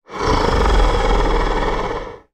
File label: monster growl